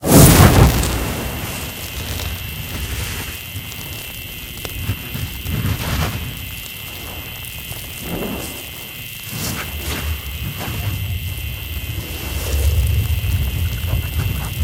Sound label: Focus Fiery; devour